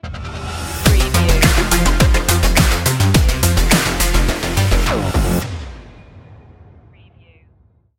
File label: funky stinger